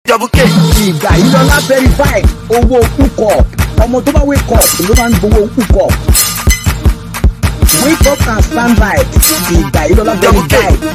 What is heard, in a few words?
Beat